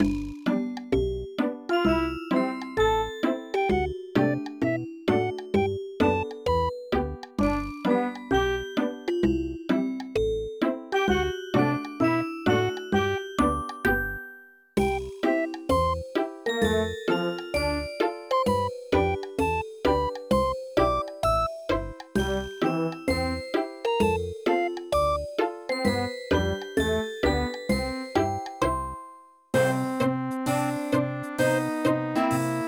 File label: Polka